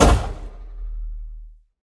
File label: foot light, antlion guard; hl2